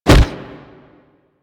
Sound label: Fighting Blocked Impact; Warframe; Weapons